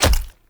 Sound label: Raw Meat Impact; Gameplay; Awakening Remaster